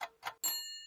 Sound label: count down get gold; game tuituibi